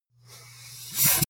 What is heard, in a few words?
pneumatic close